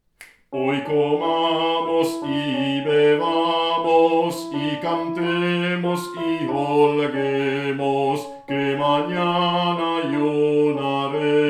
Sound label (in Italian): HOY COMAMOS Y BEBAMOS Contralti